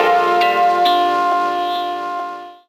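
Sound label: PAD guitar; Rawcutz - Nu Forms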